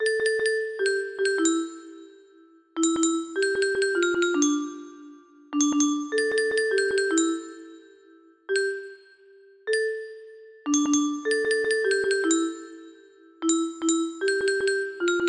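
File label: music box melody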